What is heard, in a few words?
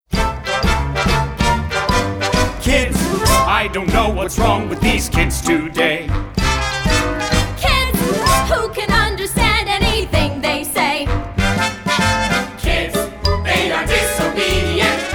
Kids Vocal Guide